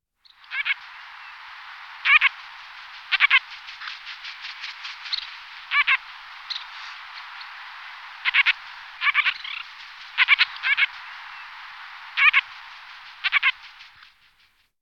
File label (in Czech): Jespak bojovny Philomachus pugnax